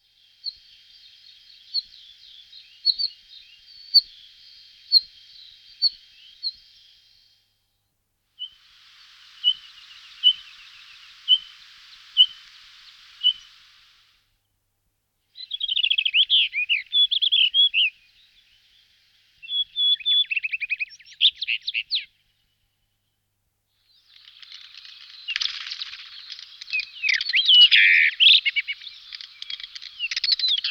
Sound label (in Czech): Skalnik zpevny Monticola saxatilis